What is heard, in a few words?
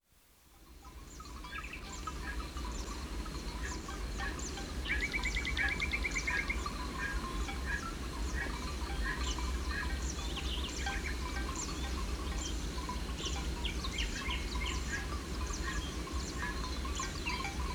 Carol of Goat Bells; Dr. Sound Effects; 210 Nature Sounds - 20 Hours of Relaxing Natural Ambiences for Meditation and Sleep